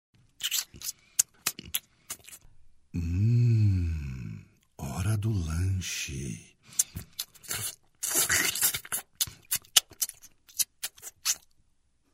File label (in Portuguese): tamandua